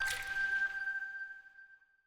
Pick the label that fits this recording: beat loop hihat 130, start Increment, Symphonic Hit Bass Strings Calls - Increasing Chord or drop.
drop